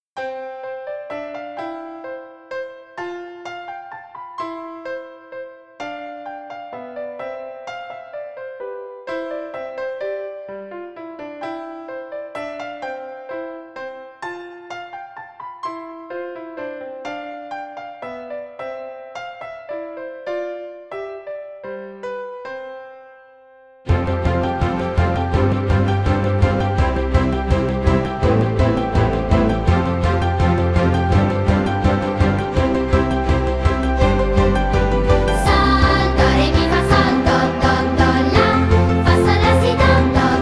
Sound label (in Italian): Minuetto playback; Bach